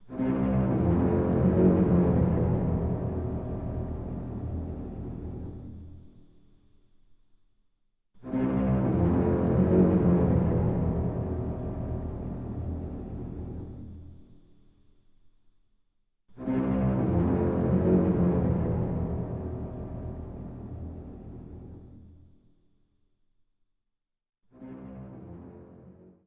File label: Stingers Fail; Night at the Museum Battle of the Smithsonian; wii; xbox 360